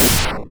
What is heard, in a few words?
explode